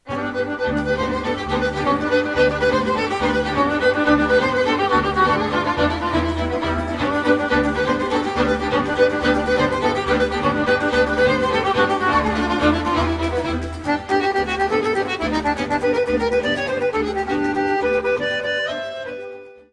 Geampara - Roumanie

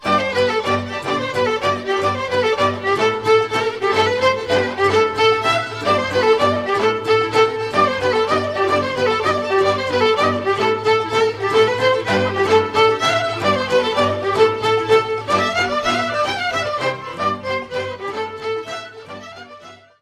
Geamparaua, Roumanie

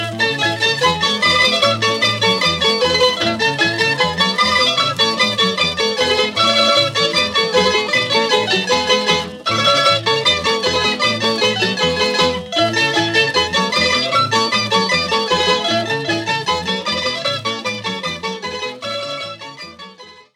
Kolo, Croatie

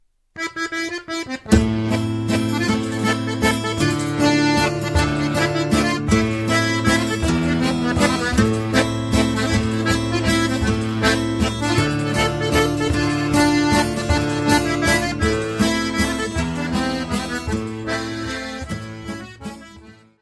Mazurka, Italie, Sicile

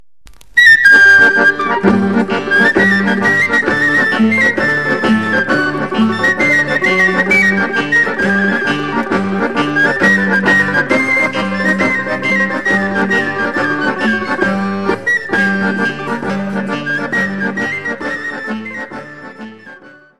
Contradanza - Italie

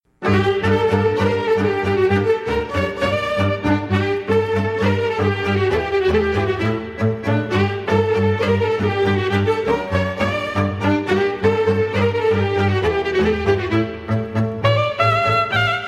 Ardeleana, Roumanie

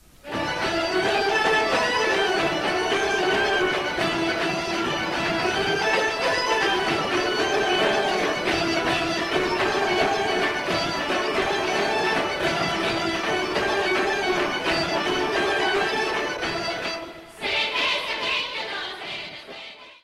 Krivo Ihtimansko, Bulgarie

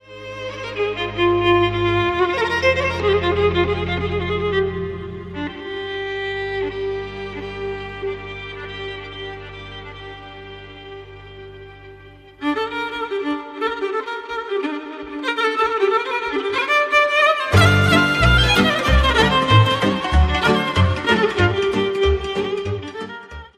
Melodie, Roumanie

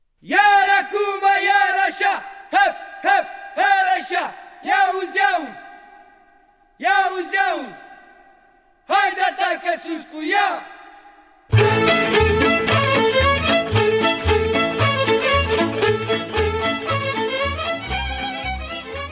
Calusul - Roumanie

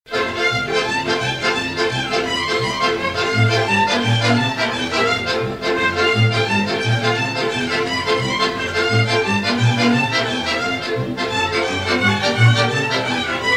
Roumanie

